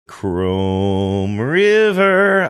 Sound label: singing chrome river